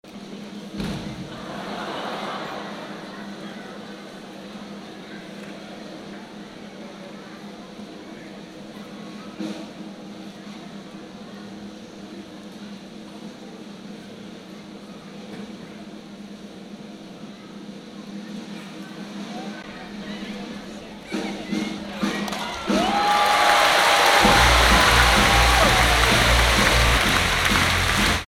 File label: circus drumroll with cheers